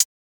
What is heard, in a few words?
hihat one shot from drumk; hip hop